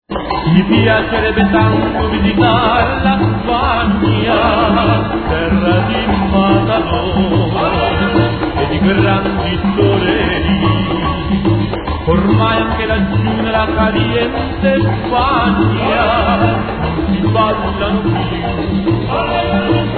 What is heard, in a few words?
Flamenco Rock